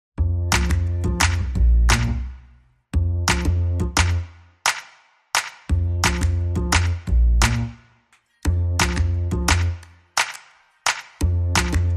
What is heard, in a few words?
No Ukulele No Acoustic Guitar No Plinky Pizz No Organ